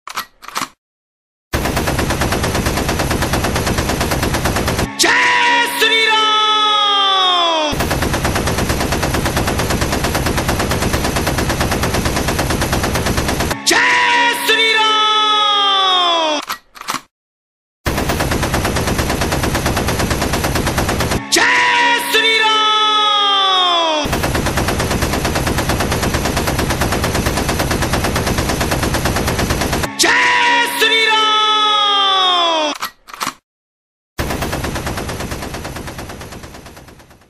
AK47 Fireing Jai Shri Ram Ringtone

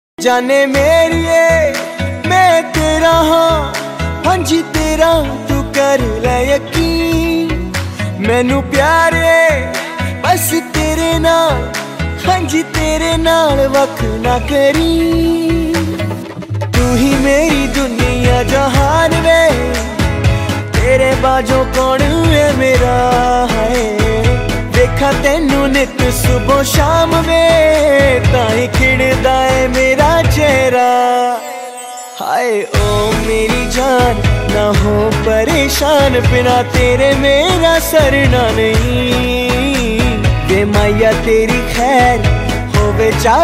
Punjabi Love Song Ringtone

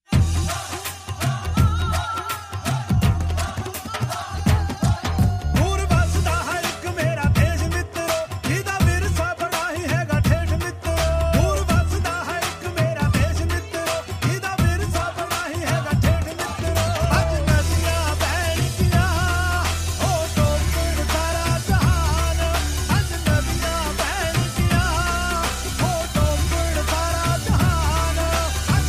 Punjabi Ringtone

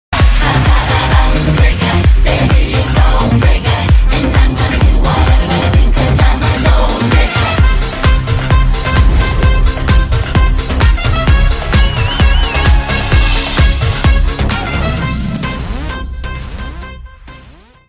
Theme Ringtone; Sonu Ke Titu Ki Sweety

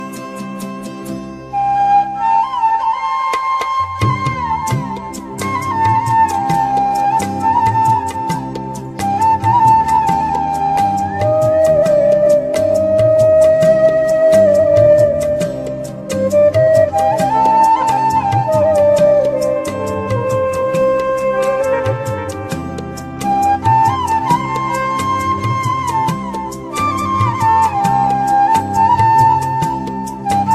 Heart Touching Flute Ringtone

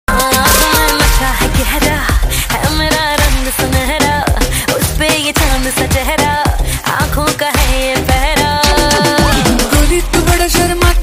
Romantic Song Ringtone

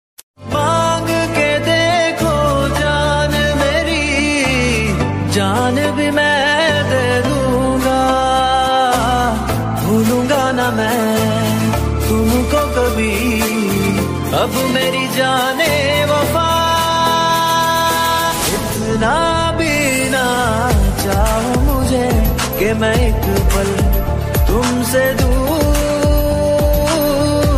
Hindi Love Song Ringtone

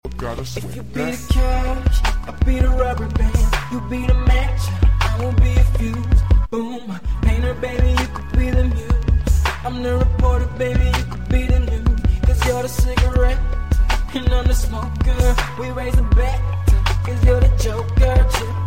Music Ringtone; Jio

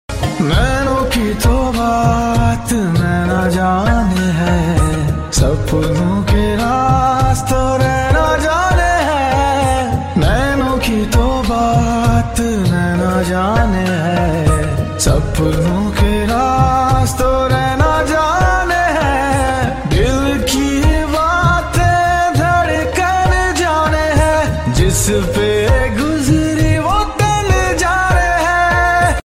New Romantic Hindi Song Ringtone

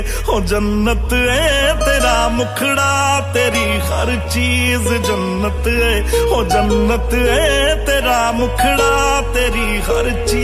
Movie Song Ringtone; Sufna Movie

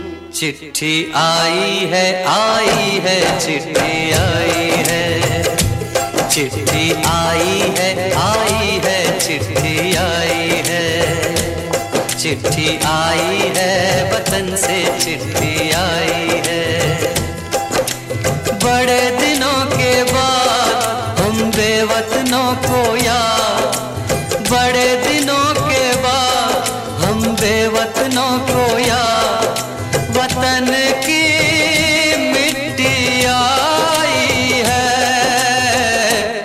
Sad Hindi Ringtone

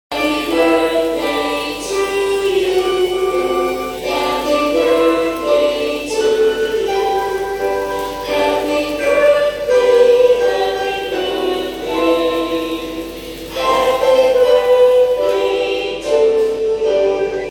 Happy Birthday Ringtone; ringtones

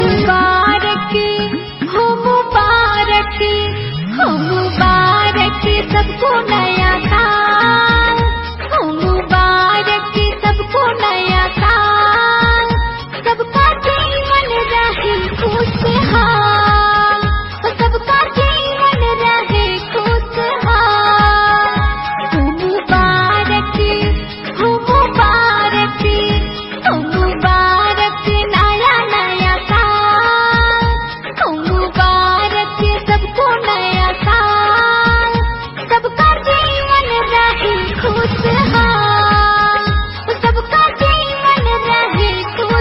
Happy New Year Ringtone

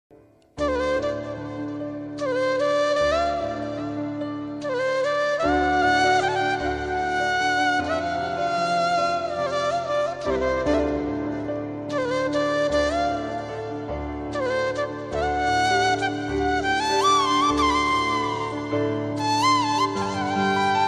Flute Ringtone

